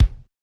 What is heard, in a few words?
Bass Drum L; Yamaha DJX-2B